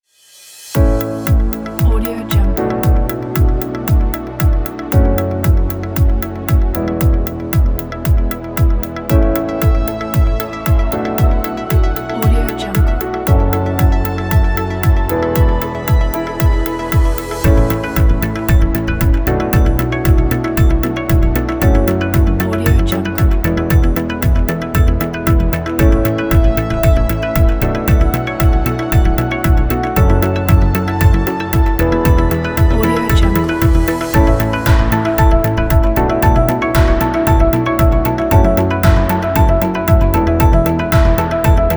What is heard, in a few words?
a new corporate upbeat